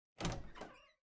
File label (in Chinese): 开门